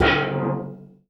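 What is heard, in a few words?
BIG TIN, percussion metallic; Rarefaction; Poke in The Ear With A Sharp Stick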